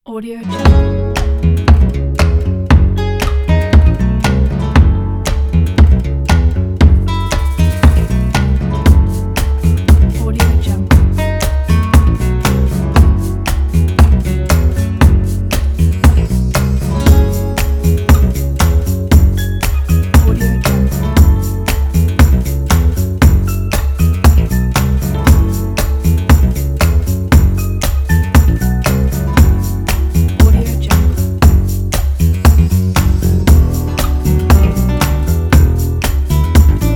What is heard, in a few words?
funky spy; garagesound